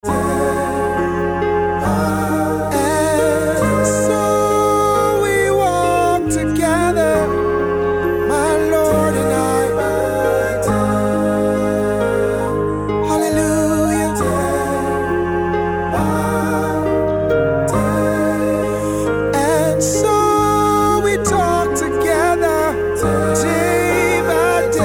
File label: Ringtone